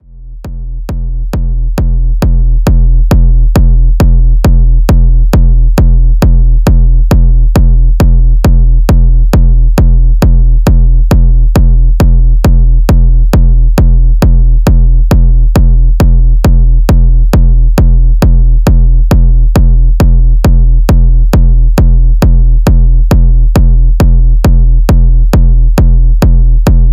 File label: signature kicks; zumstein